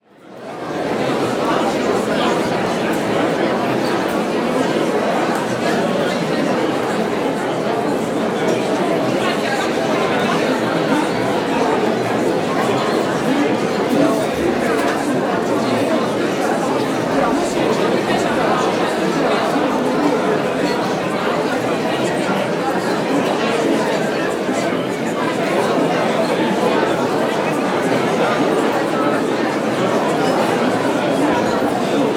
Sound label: Lobby Intermission Crowd Theater; crowd walla; Sound Ideas; Walla Series